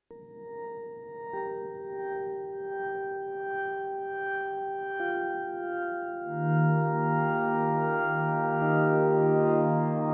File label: Prophet 6 overdub; Prophet 6 overdub fun